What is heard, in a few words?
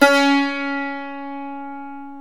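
ethnic plucks, balalaika; Eth Plucks, Pluck Duo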